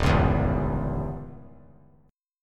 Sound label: D sharp 7th Flat 9th D sharp; strummed; D#7b9; 3,8,7,5; JGuitar